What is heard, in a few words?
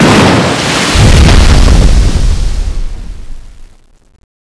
megalodon ground jump; Boss Megalodon